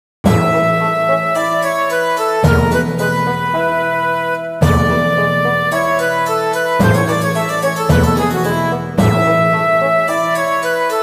Music Success event

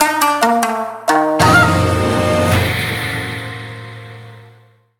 BGM Lose; Shuriken and Aliens

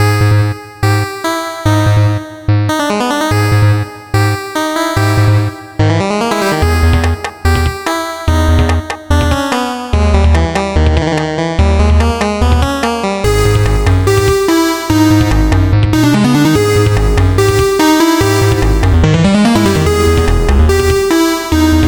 desert bpm145; super stone legacy

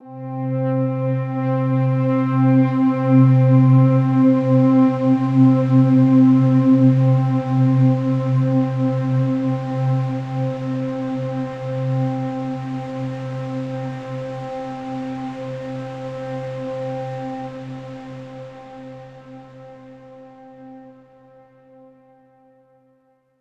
Overlay Drone; Act3 Rezoning

